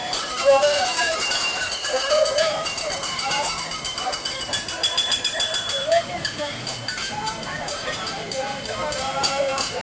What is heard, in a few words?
ambient soundscape of a b, market